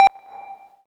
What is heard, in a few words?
Electrocardiogram